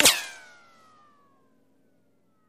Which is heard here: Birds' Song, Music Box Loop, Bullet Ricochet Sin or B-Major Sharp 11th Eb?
Bullet Ricochet Sin